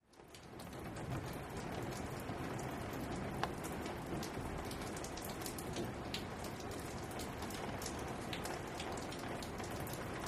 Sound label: Rain Light On Outdoo, int